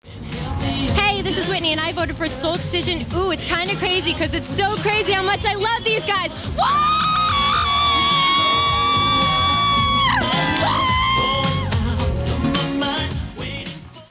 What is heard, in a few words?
whooo